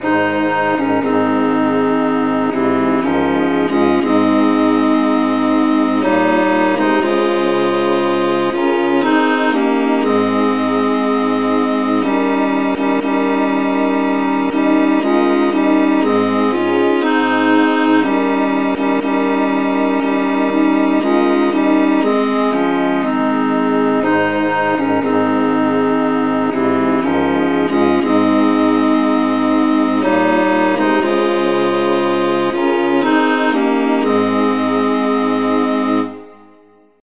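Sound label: traditional key D flat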